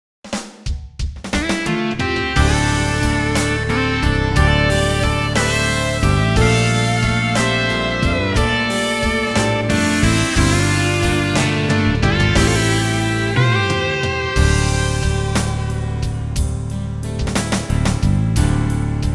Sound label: koujou blues